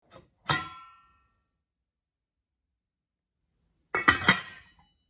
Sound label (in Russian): zvuk kryshki kastriuli